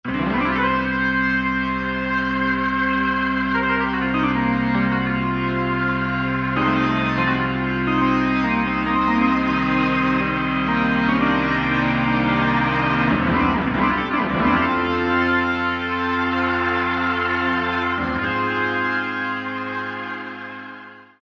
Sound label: 6 Bars - Slow to Fast Leslie - with Medium Distortion, Rock Keyboards; Greytsounds Philip Wolfe's Rock Keyboards